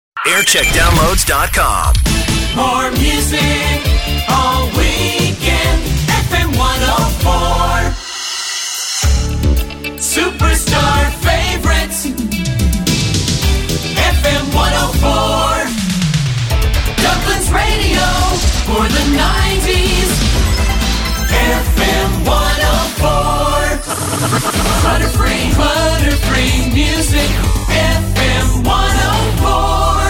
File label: Jingle Montage; FM104 Dublin; Jingle Montage (JAM) 1994; 1990s